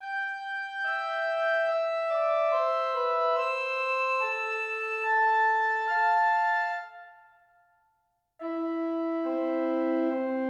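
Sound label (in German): Doppelter Kontrapunkt, Duodecima acuta e Quinta acuta; Seyfied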